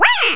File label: pain; quake2